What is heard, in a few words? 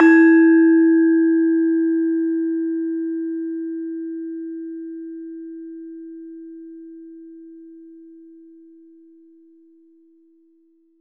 LAMEL E3, Asian Traditions; USB Soundscan vol.60; AKAI; LAMELOFO